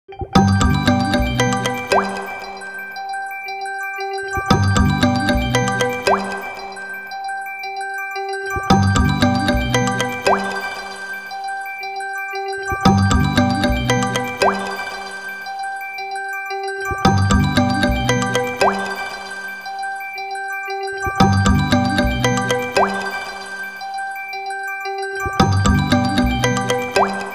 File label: Group Outgoing Ring Tone; konnektedstudios